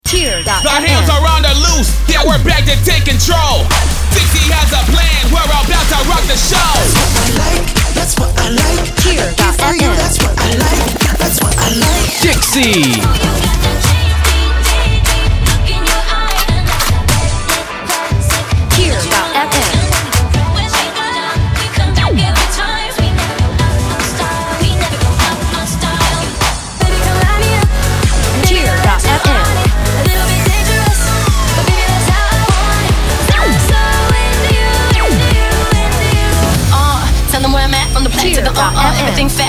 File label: Intro with loop